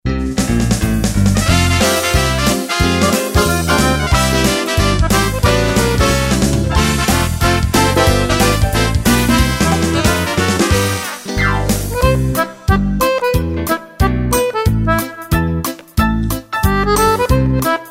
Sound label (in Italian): swing fox